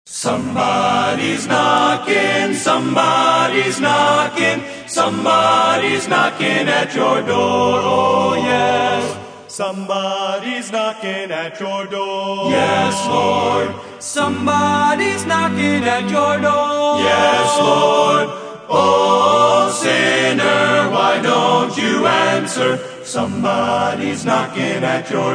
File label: Youth Dept, Somebody's Knocking At Your Door